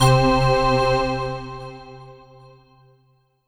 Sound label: Alesis Sanctuary QCard Glass Organ C3